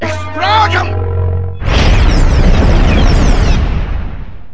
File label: explosion